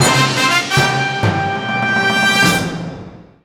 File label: Jingle End Race Good; Garfield Kart Furious Racing